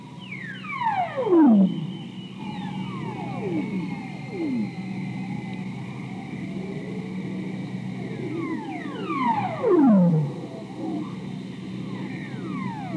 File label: ribbon seal; pinnipeds